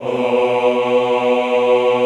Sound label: AHH B1, Classical Choir; Best Service; Extended Classical Choir